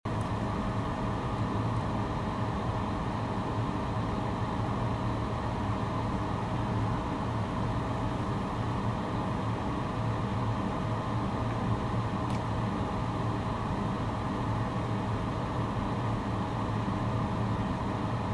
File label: dryer